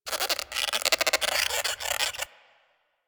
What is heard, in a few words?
Forest Rodent Squak; Warframe; Ambience; Grineer Forest